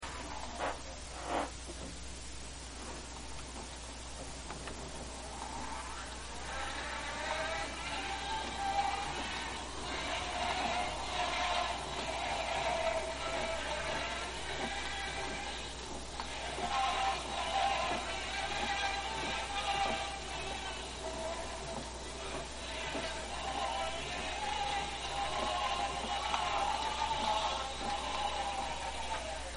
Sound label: phono